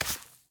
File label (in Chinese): 刷子刷沙砾声